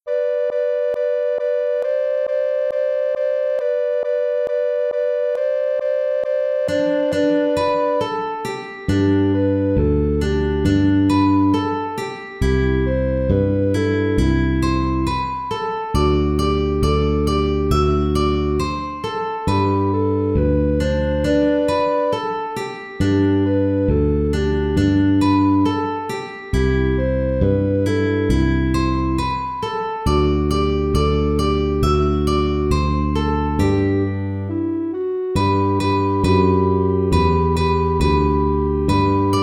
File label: jingle; kerst